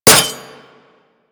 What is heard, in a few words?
Dagger Metal Impact; Dual Daggers; Hit Effects; Weapons